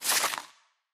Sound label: winter tile pickup